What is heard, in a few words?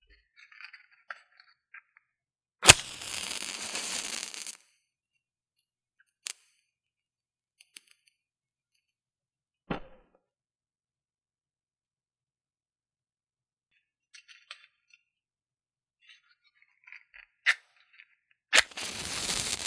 Lighting Several Matches